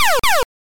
Laser

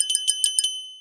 ding ding ding

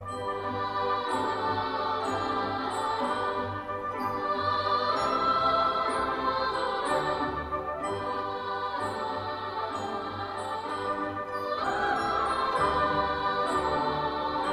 waltz

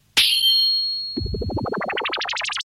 Bell

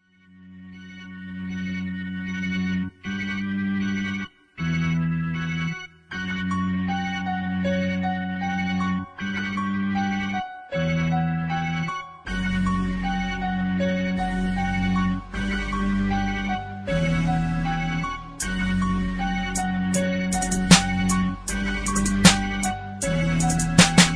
hard gangsta rap beat hip hop instrumental

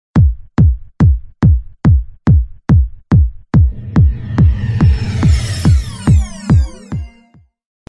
caroll of bells

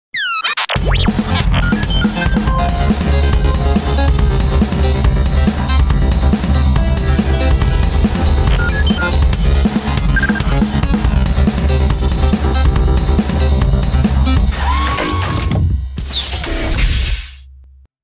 Electric Tech Mix

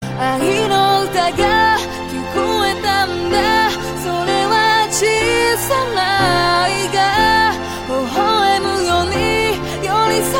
music box; classical

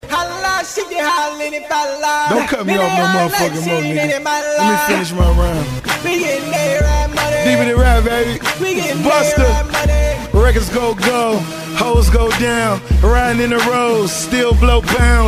Trap

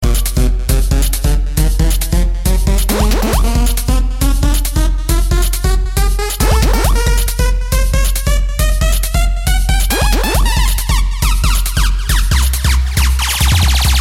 dubstep ringtone

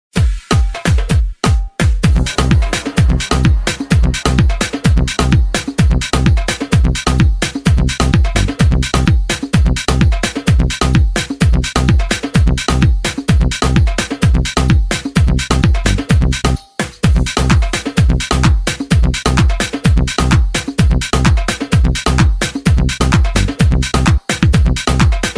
nice arabic tone; country

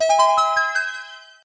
Wind; Zelda; Tetra

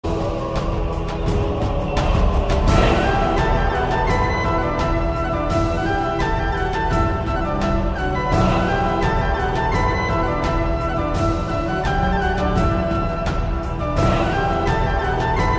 Celtic Ringtone

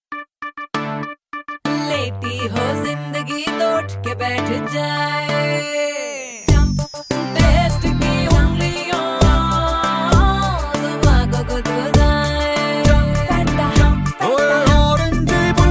fanta hindi, hip hop ringtones

